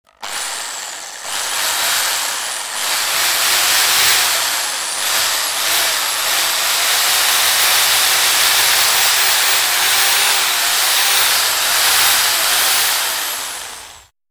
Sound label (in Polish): wiertarka